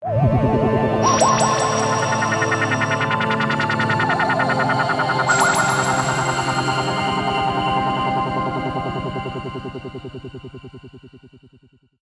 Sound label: Miracle